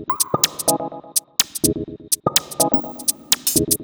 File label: 125bpm, House Drumloops